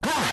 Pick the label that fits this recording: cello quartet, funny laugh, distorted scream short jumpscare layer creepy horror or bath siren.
distorted scream short jumpscare layer creepy horror